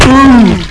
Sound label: Small Impact